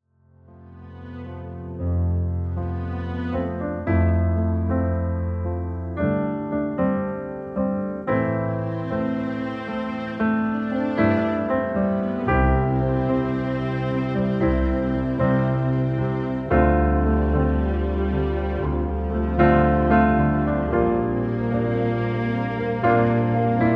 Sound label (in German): Licht playback; probe; eigenkomposition